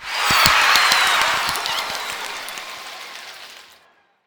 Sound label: cheer; World Of Goo